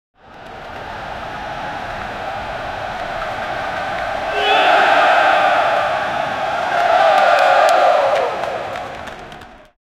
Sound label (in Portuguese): som de torcida comemorand; sport